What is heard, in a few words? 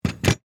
Metal Object Down, Foley, Metal, Object, Set, Down, On, Wood; Set Downs; BlastWave